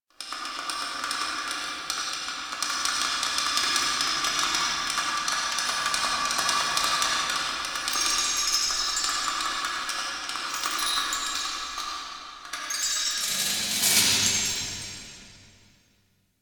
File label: Dungeon Island Chains, Ambience; Warframe; Duviri